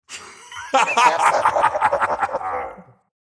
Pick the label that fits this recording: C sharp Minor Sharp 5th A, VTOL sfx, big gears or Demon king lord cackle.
Demon king lord cackle